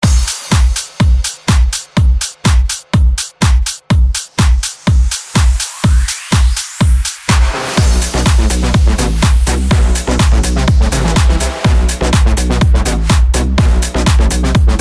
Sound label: future house redrum 124 bpm